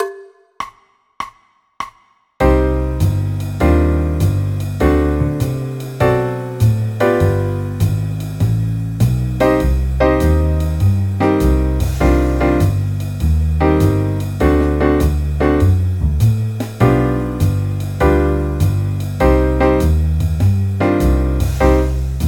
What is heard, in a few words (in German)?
c moll akkordverbindungen